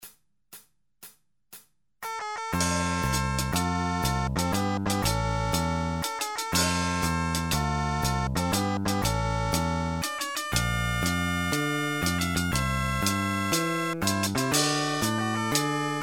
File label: intro drum scratch